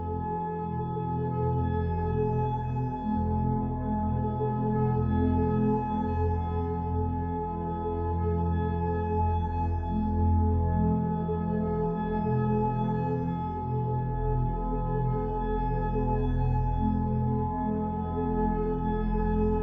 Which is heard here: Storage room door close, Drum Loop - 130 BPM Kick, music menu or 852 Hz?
852 Hz